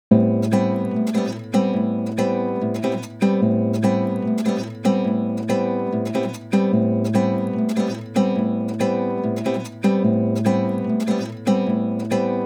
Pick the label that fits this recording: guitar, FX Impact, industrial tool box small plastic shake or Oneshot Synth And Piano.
guitar